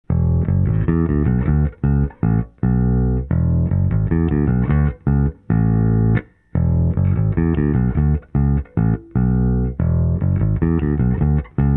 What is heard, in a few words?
SVT 3015 p bass; SVT vs SVT7 Pro; replacing my svt classic